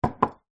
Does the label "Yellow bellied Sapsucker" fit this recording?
No